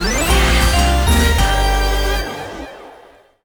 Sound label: Level Up Quick; The Adventure Pals